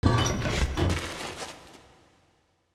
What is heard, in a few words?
Circular Vent Exit